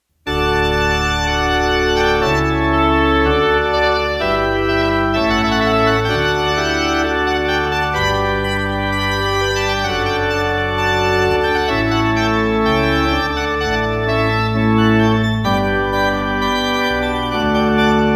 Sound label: HYMNS postlude